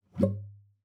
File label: GUITAR FX; Xsample VOL-2